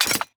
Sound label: weapon up; skyrim